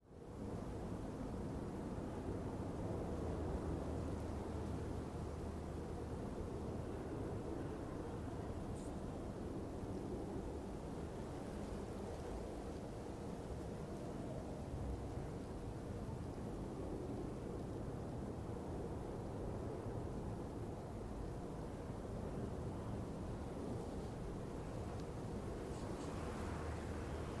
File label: spooky ambience